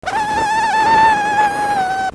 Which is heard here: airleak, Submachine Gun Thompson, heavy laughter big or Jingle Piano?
airleak